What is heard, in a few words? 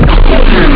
damage; ParadroidII